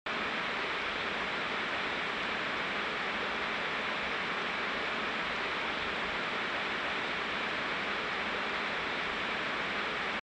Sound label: TV Signal Noise Retro